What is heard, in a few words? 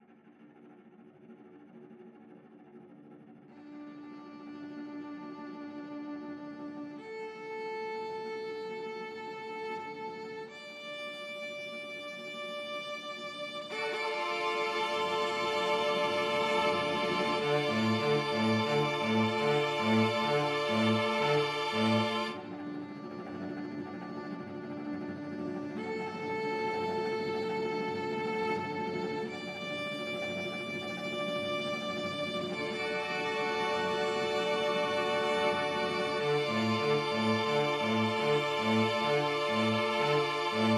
string quartet; also sprach zarathustra